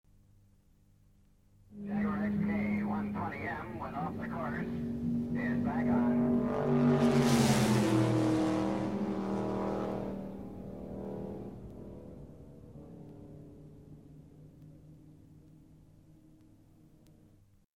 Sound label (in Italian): PASSAGGIO AUTO DA CORSA